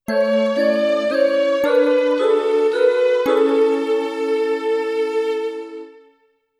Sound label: Game Over; Hisyou